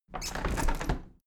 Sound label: window handle plastic squeak open tilt regular; objekte; fenster